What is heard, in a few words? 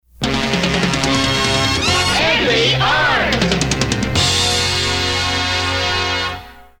drums shout